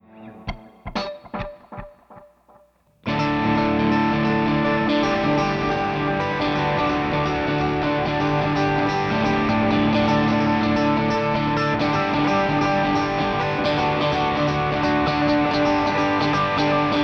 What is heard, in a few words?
echo Note